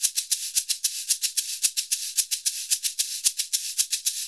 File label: SHK, Shaker; Univers Sons; Basicussions